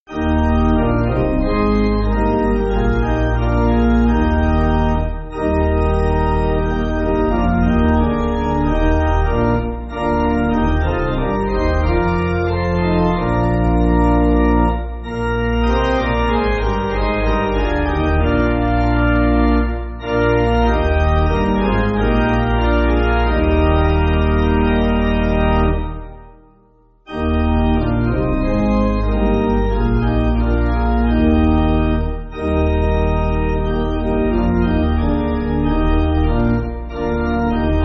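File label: Lord Creator And Father, pipe organ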